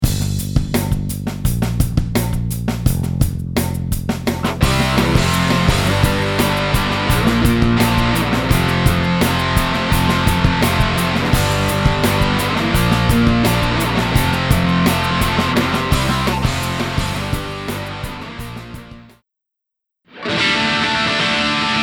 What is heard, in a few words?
ds-2 sound check